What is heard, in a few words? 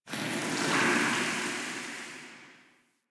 Pipe Sizzle Amb; Ambience; Warframe; Grineer Galleon